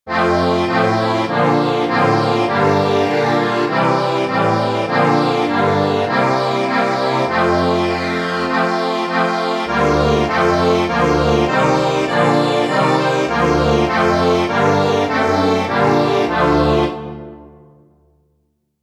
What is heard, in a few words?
accordion